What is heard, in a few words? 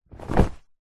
clothing jeans demin drop set down carpet floor; foley